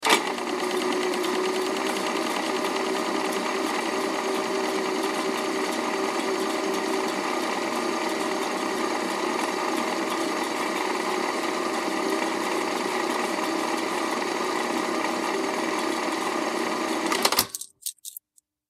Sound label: projector start and stop; elmo